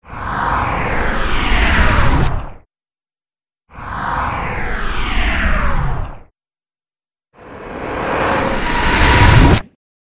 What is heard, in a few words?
Flashback Entry Com, whooshes & swishes